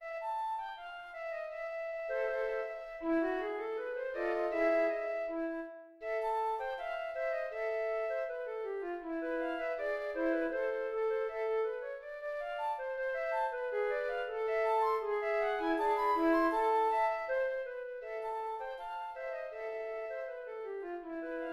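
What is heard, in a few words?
flute quartet; acorazado rivadavia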